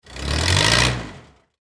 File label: Forklift Rev Engine Short; Machinery; Industry; Machines; Blastwave